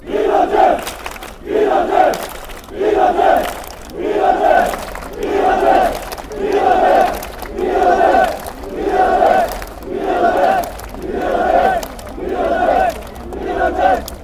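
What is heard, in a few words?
clap clap, football songs and soccer chants; Association Sportive de Saint-Étienne Loire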